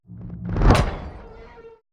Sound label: Whoosh Horror Screams Metallic Rattle By; 2496SoundEffects; Whoosh Pack 1